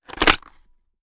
reload empty mag in; gmod

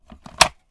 reload fast mag out; gmod

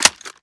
mike4 reload mag in, weapons; gmod; viper

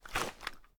reload rotate, weapons; gmod; viper

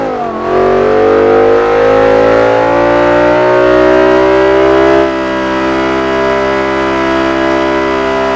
third, vehicles; bmwm5e60

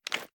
reload fast empty up; xmag; gmod; pi mike

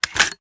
reload drum drum out; gmod

